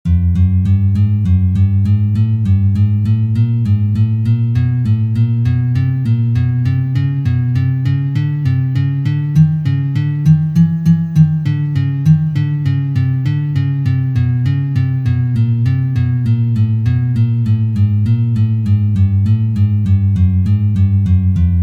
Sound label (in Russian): Uprazhneniya dlya gitary 1 2 3 4